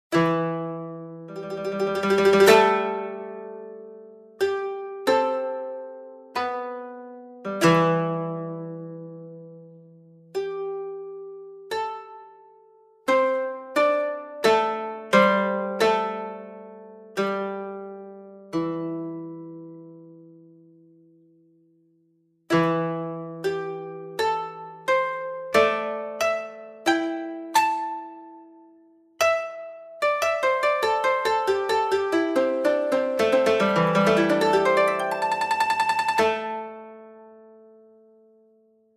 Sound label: koto; 2013 NewYear; greetings